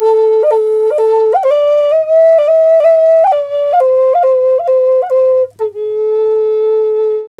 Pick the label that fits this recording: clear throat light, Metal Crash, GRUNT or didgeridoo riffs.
didgeridoo riffs